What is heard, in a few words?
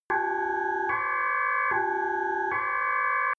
sci fi alarm facility slow; soundpool